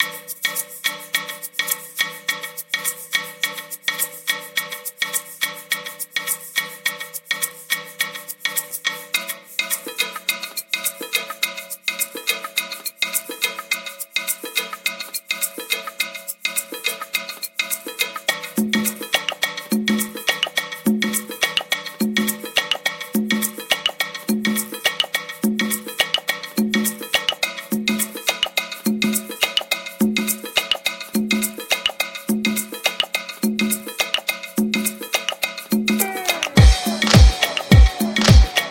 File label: Agua Congas